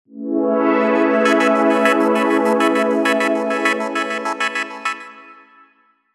innovation pulse

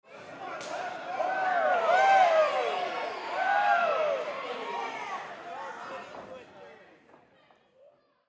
match with crowd

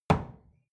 wood wood staff indoors

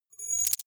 appear splutter

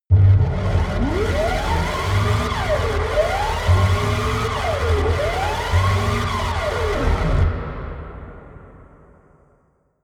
siren

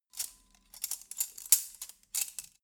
cutlery forks handling

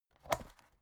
folder open

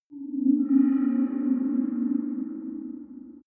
bio scanner

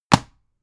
dvd case throw down

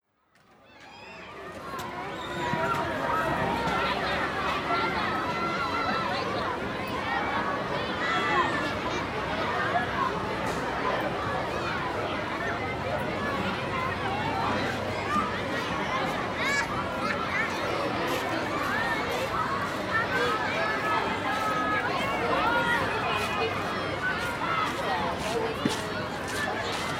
playground atmo usa

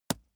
wood impact small lash

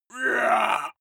male shout hit

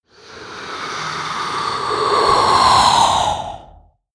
exhale female

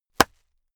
plastic bag burst small pad

